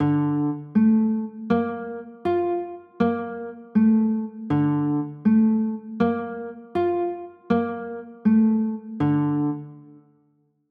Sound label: b flat maj7